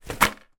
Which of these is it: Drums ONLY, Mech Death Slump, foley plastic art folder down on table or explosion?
foley plastic art folder down on table